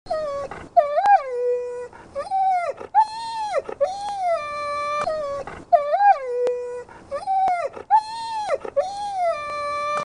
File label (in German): hund alt